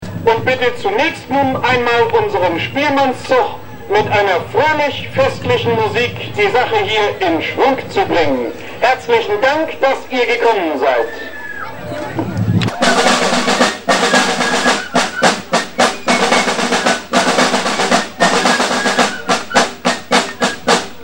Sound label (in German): spielmannszug; lengerich